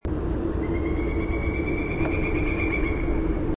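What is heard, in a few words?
Pileated Woodpecker wuk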